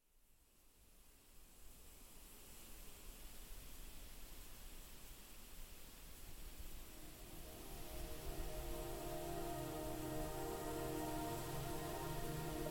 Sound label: Golden Temple Soundscape; trail magik; sierras to washington